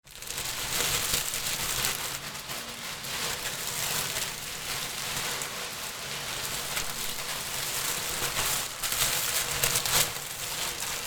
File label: plastic raincoat